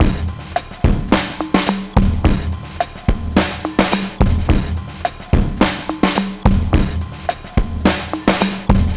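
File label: drum 107, 107bpm, Breakbeat; ProSamples vol.40 - Breakbeat 2; Best Service; Boom Boom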